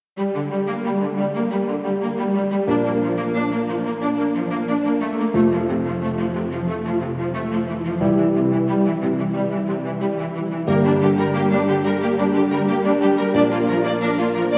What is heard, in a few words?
Epic Cinematic